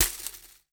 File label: Pebbles, Drum & Bass Hits & Bits; Total Drum&Bass; 90 s Sample CDs